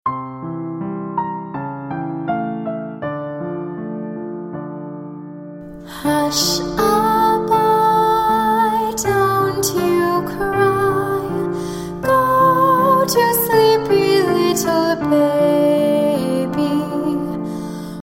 vocal; All the Pretty Little Horses; lullabies

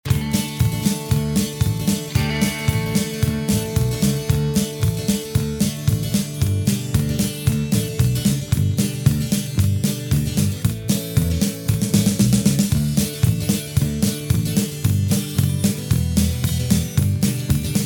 elements of art song instr; musical plays

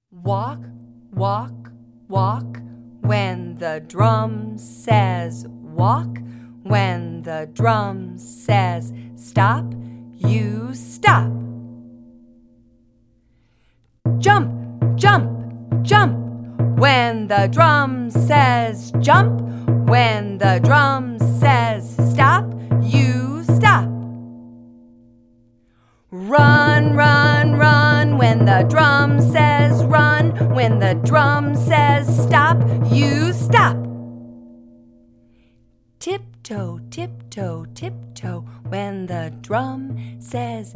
drumming medley; Songs for Teaching

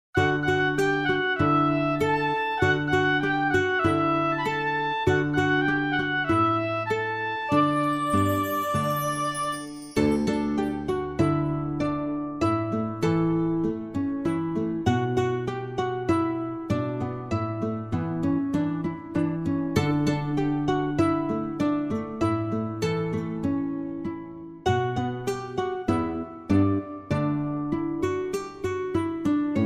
instrumental

